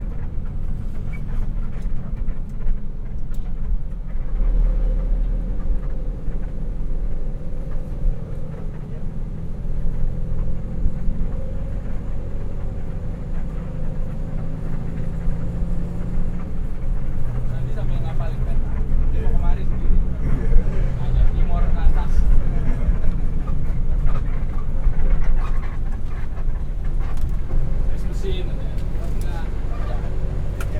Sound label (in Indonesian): motor di areal sawah; GALUNGGUNG; PERTANIAN